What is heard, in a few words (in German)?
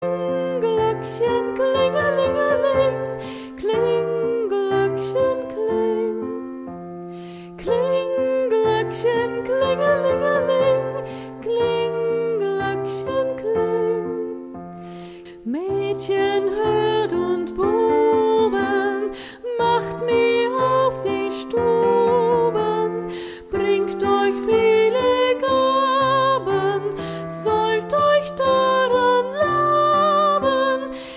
glockchen